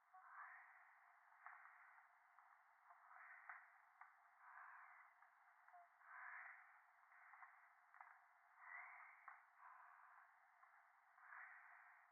電話 右耳密着吐息 山奥

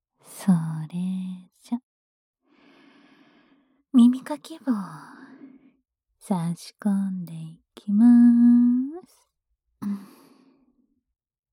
【左】浅め耳かき, ASMR

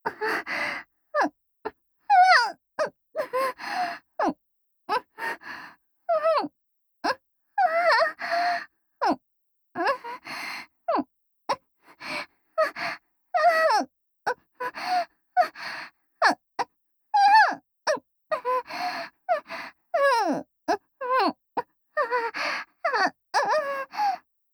喘ぎ小

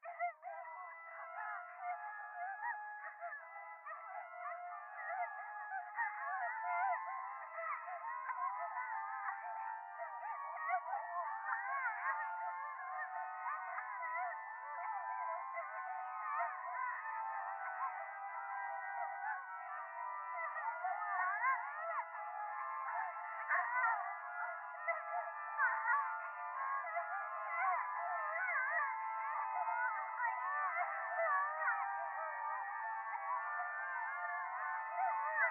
電話 喘ぎ 讃美歌

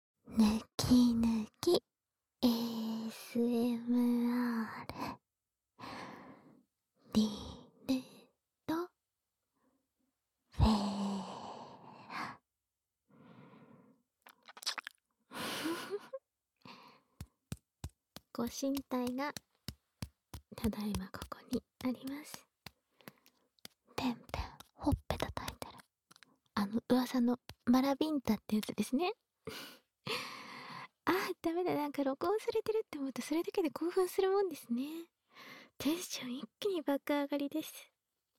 本物ディルド舐めASMR

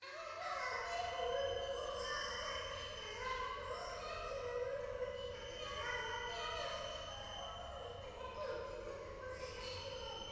教会 可愛い喘ぎ 山奥

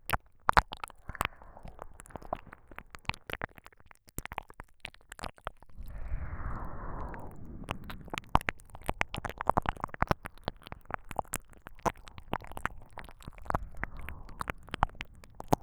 両耳舐め ループ対応

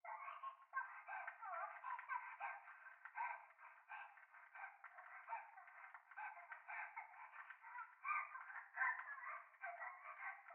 トイレ 可愛い喘ぎ 暖炉

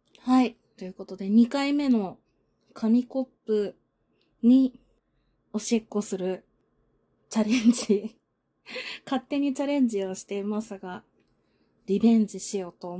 紙コップおしっこチャレンジ; 紙コップおしっこチャレンジ２回目！リベンジですッ！今度こそ紙コップに入れるッ！

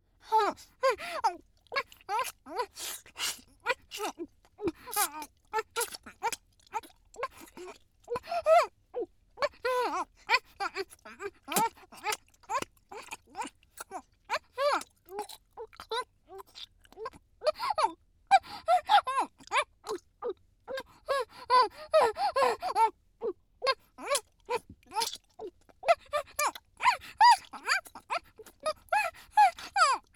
フェラチオ; SEあり

